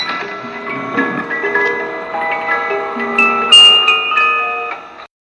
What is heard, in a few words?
mystery box